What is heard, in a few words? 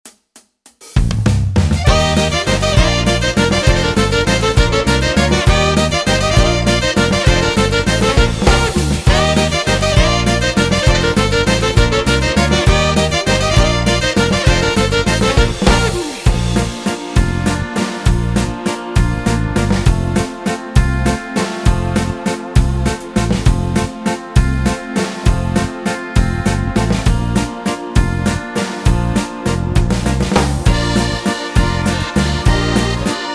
Midi Yamaha; mel ludowa goralska; midi yamaha psr s750